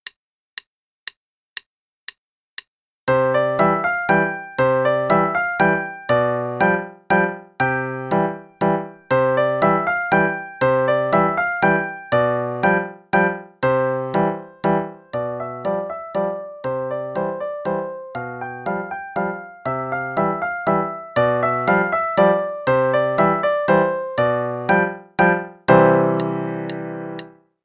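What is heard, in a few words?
Ice Spinners Waltz (left hand waltz, quarter note=120); Level 2B - 2nd Ed; Faber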